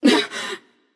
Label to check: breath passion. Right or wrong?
Right